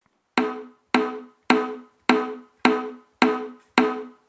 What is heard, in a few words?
CONGA